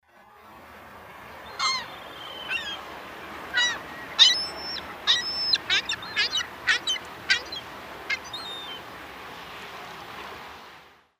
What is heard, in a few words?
ring-billed gull; paul marvin